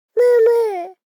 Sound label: BABIES TALK Boy 12 months Mama Sad; Baby Boom